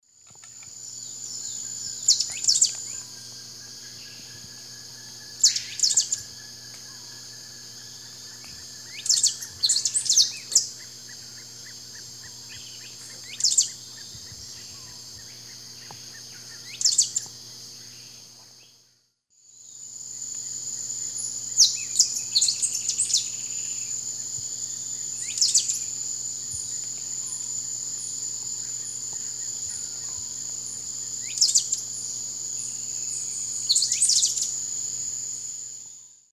Euphonia minuta, song; S. America; Eastern Lowlands

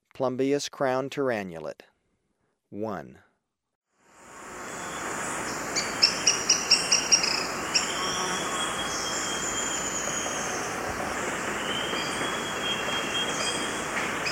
Plumbeous-Crowned Tyrannulet; S. America

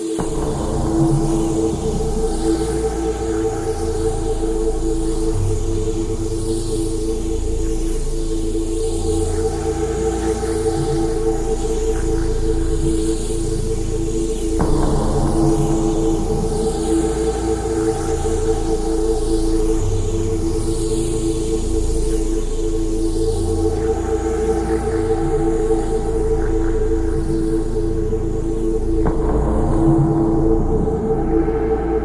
Moments of Ambience

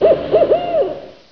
OWL; ANIMAL Soundclips

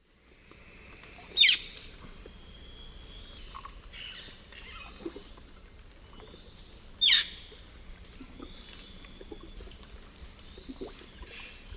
Cyanocorax violaceus, alternate call; S. America; Eastern Lowlands

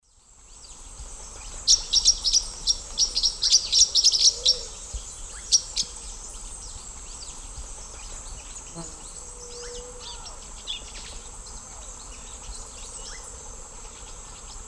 Forpus xanthopterygius, flight calls; S. America; Eastern Lowlands

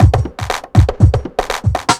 chemical 120, loops 120bpm

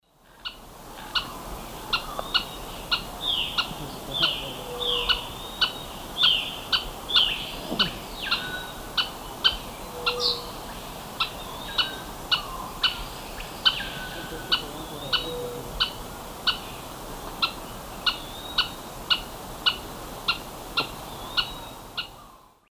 Myiozetetes granadensis, call; S. America; Eastern Lowlands

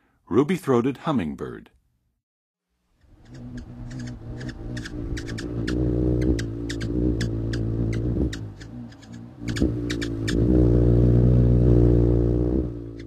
Ruby-throated Hummingbird, Western USA Songbirds; North America

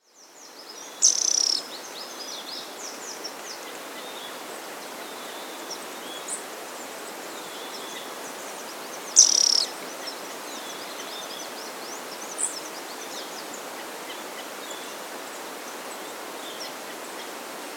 Cinnamon Flycatcher (Pyrrhomyias Cinnamonea); S. America; Andean Birds; Natures Songs

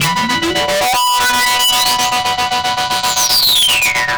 Hyperfuzz, Guitars

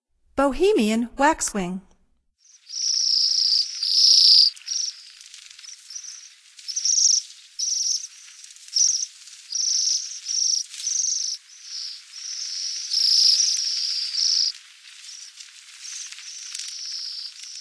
Bohemian Waxwing, Songs of Birds of North America; Stokes

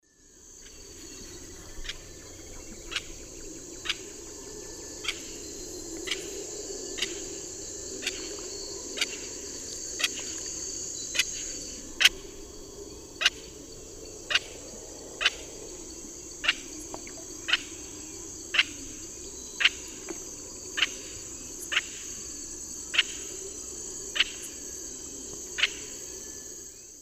Megaceryle torquata, flight calls; S. America; Eastern Lowlands

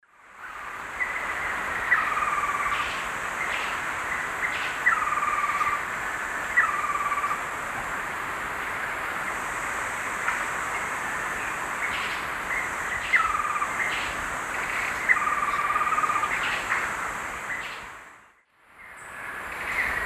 Monasa morphoeus, calls; S. America; Eastern Lowlands

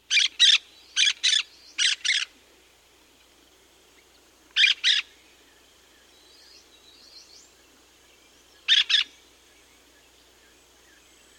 Olive-Throated Parakeet, S. America

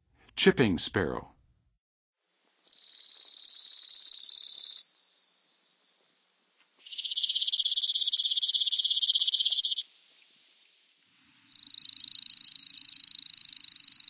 Chipping Sparrow, Songs of Birds of North America; Western USA; Western USA Songbirds

